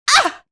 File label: pain, female